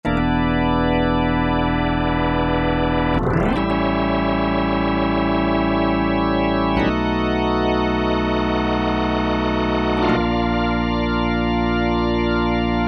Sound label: Rotary Organ, Korg Wavestation A-D